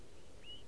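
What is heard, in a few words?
Swainson's Thrush good, nocturnal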